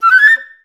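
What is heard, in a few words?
flutes A5; Best Service; Peter Siedlaczek's Advanced Orchestra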